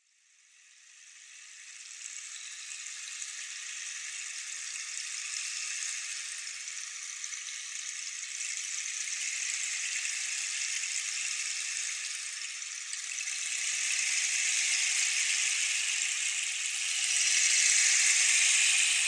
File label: Rain Stick